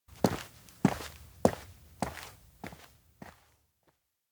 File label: steps indoor workshop exit; anemoi パッケージ版 1.1